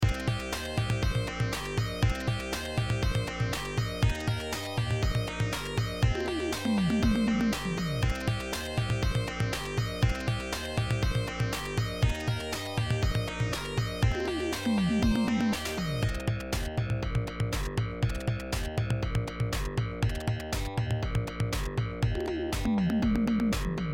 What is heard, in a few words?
bass basstone lead sound beat